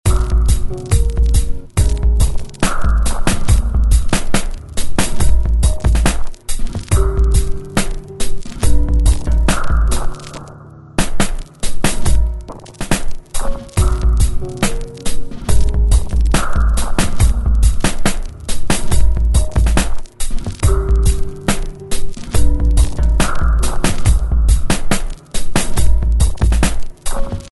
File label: beat of granular